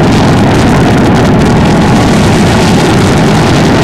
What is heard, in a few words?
me163 engines very high; War Thunder